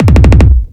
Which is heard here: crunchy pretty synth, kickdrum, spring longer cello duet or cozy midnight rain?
kickdrum